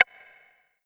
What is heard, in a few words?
click; breakhack